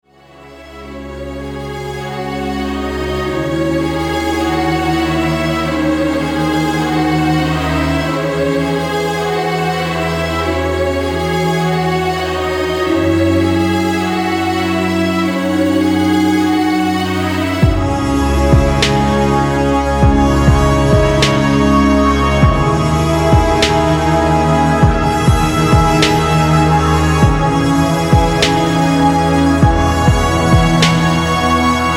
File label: orchestra; London